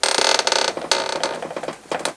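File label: squeaky floor, ambience; blackops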